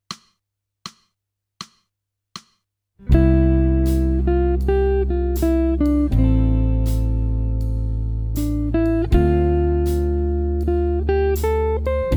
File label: jazz